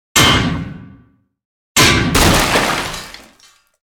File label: Smash Door; gamerip; Idle Champions of the Forgotten Realms